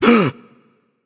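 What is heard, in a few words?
nonverbal breath; voicepack; smite; merlin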